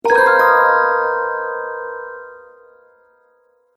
Game Haunting Appearance Chime, Video Game Sound Effects